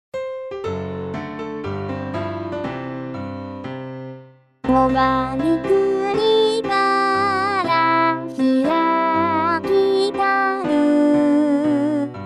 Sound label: vocaloid